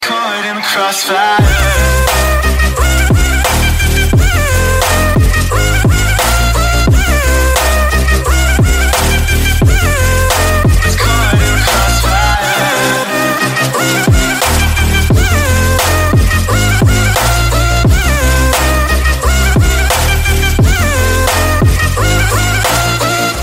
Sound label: EDM